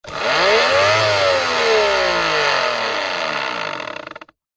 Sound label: industrial pump electric handheld on off short burst close to motor powerful rev